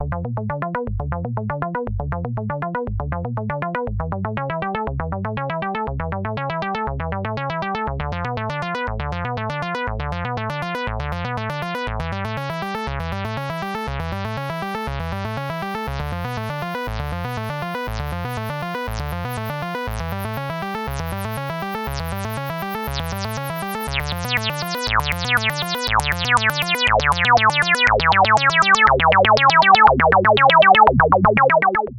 Arpeggio Saw Synth Resonance; WAVES Element 2

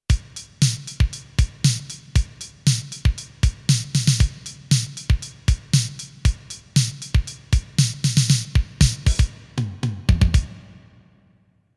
Sony DRE 2000 Wersi EX10R Drums Reverb

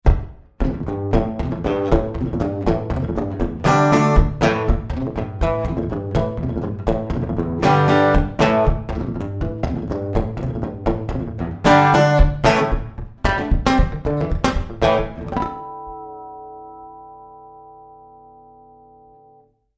Room

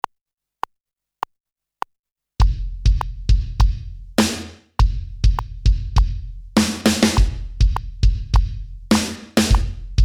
LINE POWERKIT

